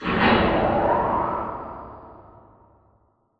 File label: Robot Arm Track Start Dist; Boomtown; Titanfall 2